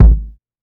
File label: fat bass drum single hit g sharp key; Bitdepth 24